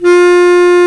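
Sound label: Bass Clarinet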